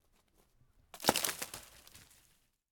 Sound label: Throwing A Stone Into A Pile Of Branches Distant Cracking